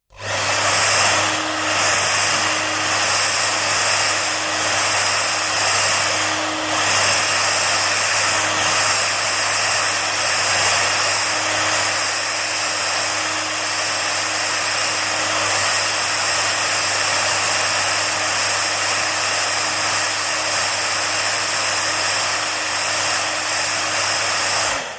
household hairdryer on run movements off rear visage professional